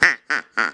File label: Duck call, cartoon Foley Fx; musicradar; cartoon caper